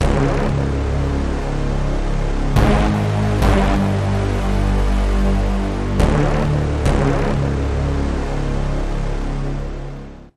Looping

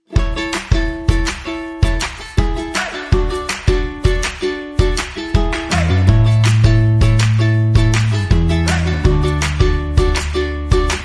Less Guitar and No Melody

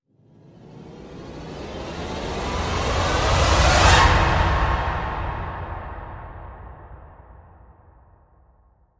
Choral Rise

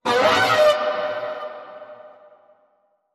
Creature Howl

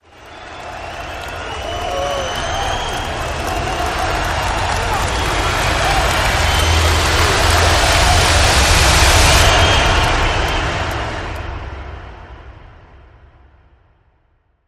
Victory Chant Rise

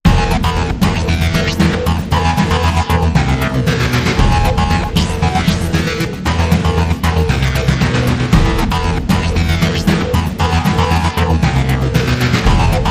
Less Drums

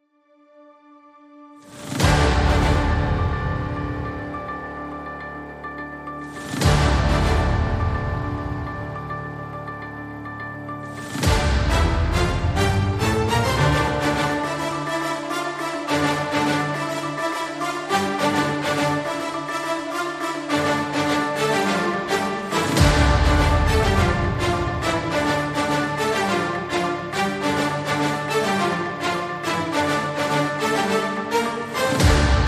No Drums No Bass

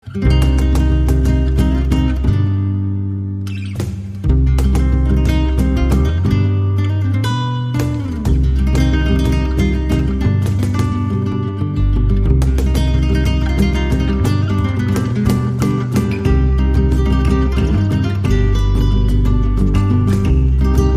Peruvian

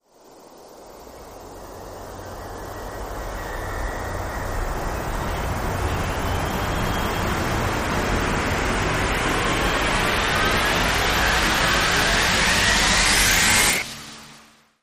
Rise

